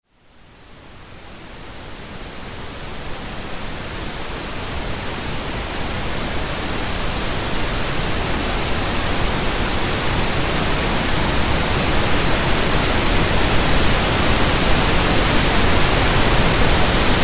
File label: Pink Noise